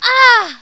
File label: Ahh, Fia, voices; Riviera